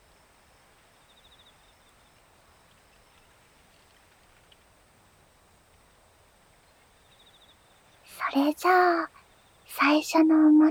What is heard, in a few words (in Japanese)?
耳かき 〜右耳・左耳〜; シャム猫編